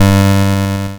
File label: NOTE bass G2, LSDJ; LIMAT LSDJ kit